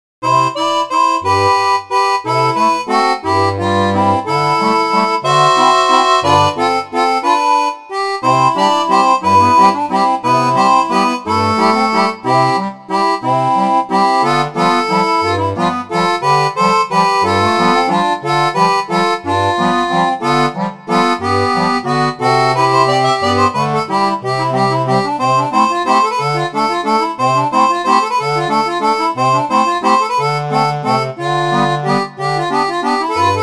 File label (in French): seraphone, orgue barbarie